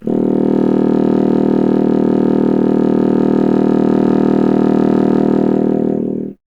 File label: C Bassoon; Miroslav Vitous - Solo Instruments VOL-1